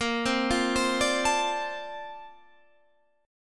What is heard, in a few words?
D-Minor 7th Bb, chord